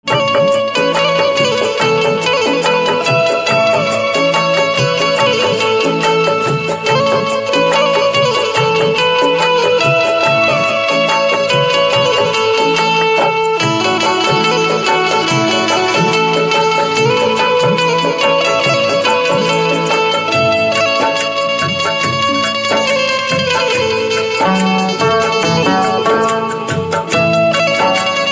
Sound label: Banjo